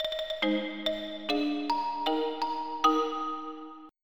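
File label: xylophone; Roland - An Invitation To The Roland World Of Music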